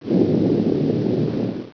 boulder appear; F.A.R.M. Patrol; MacEasy Magazine CD - Issue 18

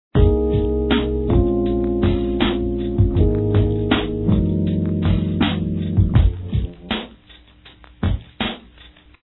level 1 ice lost; winter challenge

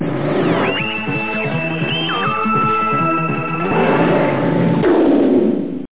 tune; drwho

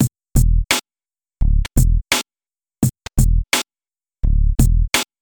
Drums Gated Bass; Logic Fun

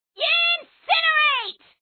yincinerate; Rabbit Rivalry

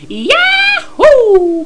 yahoo

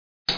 HAMMER

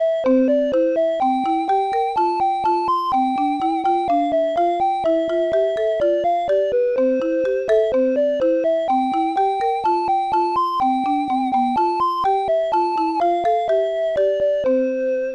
music

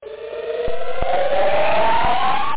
heal; shadow

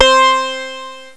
harpsichord; Echo 1.1

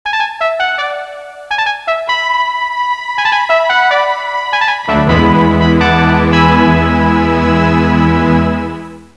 Fanfare

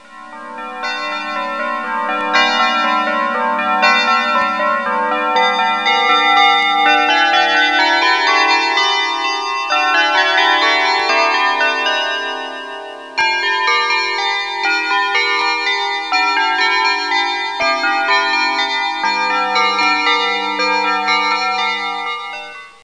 Bells; Seasonal; Christmas Stack V1.6

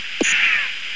fx ahh; sensillysoccer

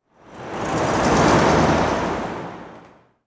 traffic; zones

